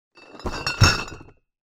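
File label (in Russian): ящик с пивом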